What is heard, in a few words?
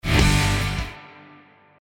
guitar stab